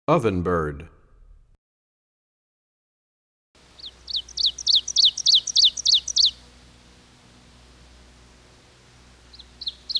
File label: Ovenbird, Bird Songs; Animals; 101BirdSongs